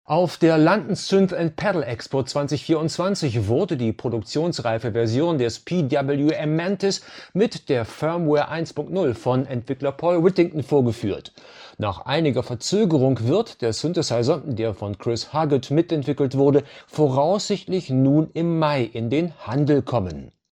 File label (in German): Shure MoveMic audio app preset Gesang